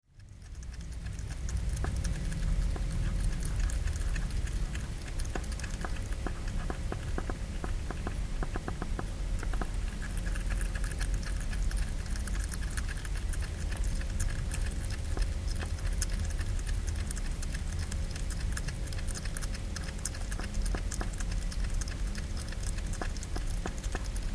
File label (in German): fressender Igel